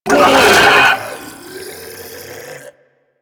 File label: Infested Jetpack Pain; Warframe